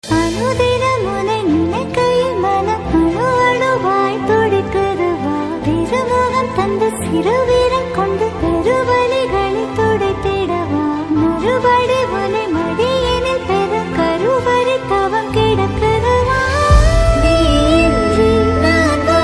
BGM Ringtone

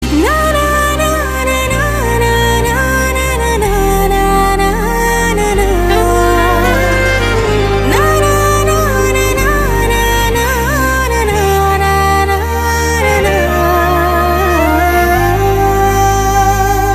FeMale Humming

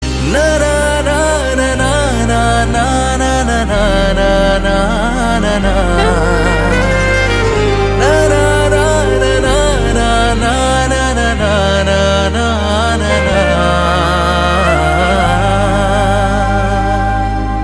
male humming ringtone

